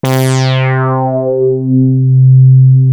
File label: Prophet 5 C4; Hollywood Edge - Giorgio Moroder Rare Synthesizer Collection